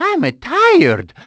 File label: tired; mario; sm64